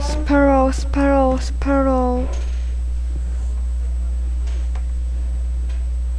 sparrow

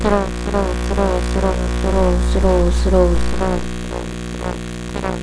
throw

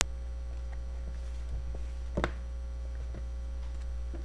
meow

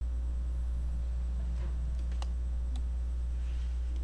switch

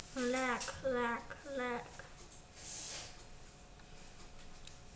laugh

